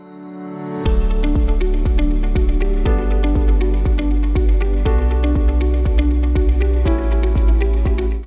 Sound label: music